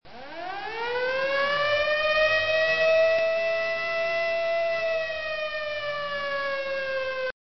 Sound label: air raid siren